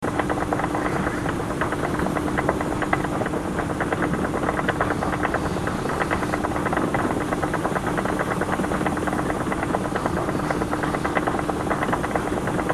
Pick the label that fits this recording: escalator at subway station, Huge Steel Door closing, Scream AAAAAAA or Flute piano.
escalator at subway station